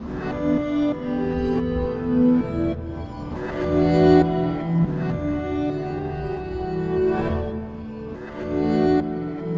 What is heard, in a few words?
Scree Song, Acoustic Drone; Sonic Foundry (Sony Creative Software) - Friendly Fire; DroneLoop